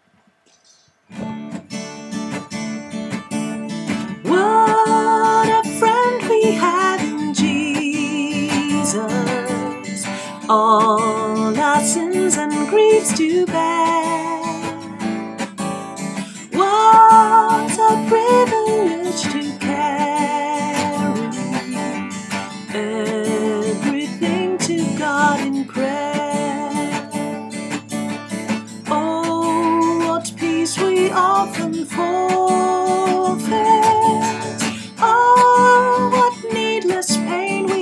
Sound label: what a friend soprano